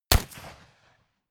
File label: rifle ak-47 exterior fire single clean; comedy-cartoon; Pistols and guns; pistolen-gewehre